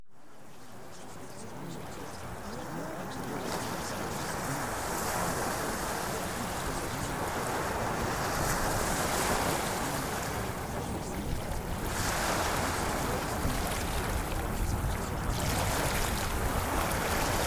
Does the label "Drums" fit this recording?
No